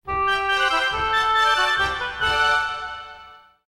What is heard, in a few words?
fanfare; WoWInterface; AllTheThings